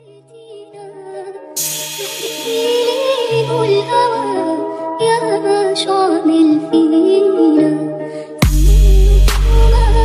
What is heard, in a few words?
arabic beat